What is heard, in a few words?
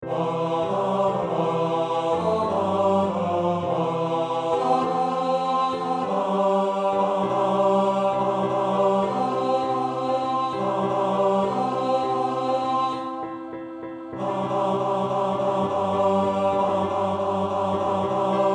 Joy to the world Tenor